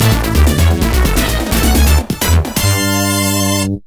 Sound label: Jingle - Act Clear; Battle End Bgm From Yū Yū Hakusho - Makyō Tōitsusen